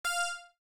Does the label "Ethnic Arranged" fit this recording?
No